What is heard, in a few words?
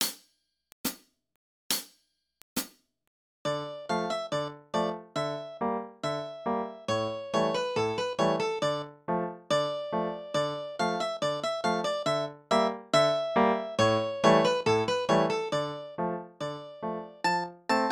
Glinka Polka, balalaika